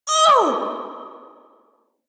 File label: Pain Lich B